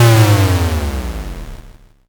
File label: explosion l